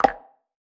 Drop

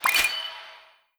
Team Skill Normal; disney star smash android ios mobile gamerip 2020

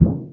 Swing

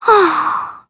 Emo Sigh; disney star smash android ios mobile gamerip 2020

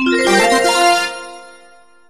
Get Skill; Entaz Baseball 2011; Android

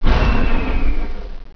Die; Virus: The Game

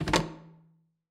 Switch

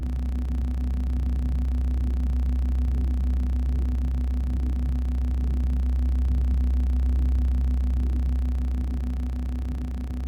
Ground Bot Movement

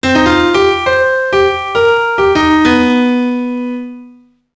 Piano Play; manor; gamerip; max payne

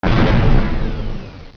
Die; Virus: The Game

